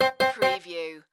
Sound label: Casino Electronic Sound Winning Tone